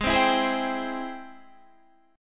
G sharp Augmented Major 7th G sharp Guitar Standard; G#+M7 strummed; JGuitar